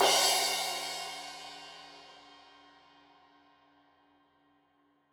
Crash 15in L edge, Cymbals Stereo

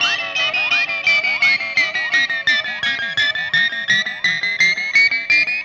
Static FX Guitar

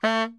BARI FF A 2, saxophones; Greytsounds - Philip Wolfe David Crozier's Saxophones; BARI SHORTS

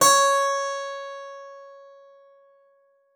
SPIN C5, SPINET; Xsample VOL-7

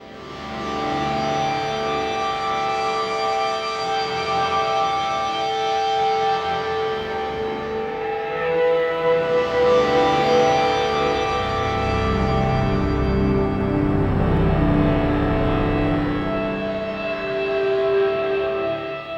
Elektro Mass, Elektrik Drone, Sky Church; Sonic Foundry (Sony Creative Software) - Friendly Fire; DroneLoop

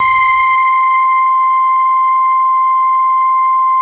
CELLU C5, Yamaha VP-1; Music Store; 90's Sample CDs; CELLULOID

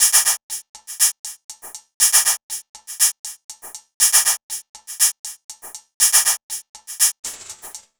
Hiss Beat, Electronic Beats; Sonic Foundry (Sony Creative Software); Myths of Technology

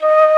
TRON FLUTE; E-MU Formula 4000 Series Vol. 3; Analog Odyssey; VK Instruments

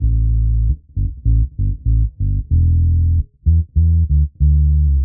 Bass 95bpm A; musicradar; dub designer

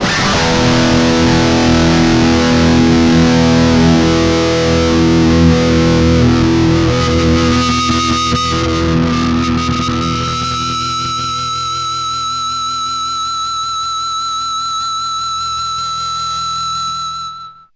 Guitar Licks

